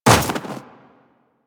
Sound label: Run Snow, Footsteps; DE; Warframe; Tenno